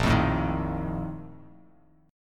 Bb Major 7th Suspended 4th, chord

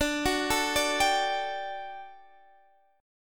G Major 7th Suspended 2nd D

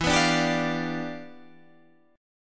E Minor 7th Flat 9th E

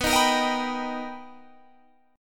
B Augmented Major 7th B Guitar Open G, chord

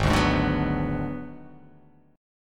Ab Minor 7th Flat 5th Ab, down; strummed; JGuitar

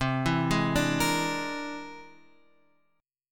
C Augmented 9th; C+9 Chord {8 7 6 7 X 6} arpeggiated

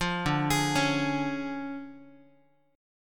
D Minor Major 7th Flat 5th, D, Guitar; 8,10,11,11,x,x; DmM7b5 arpeggiated; JGuitar

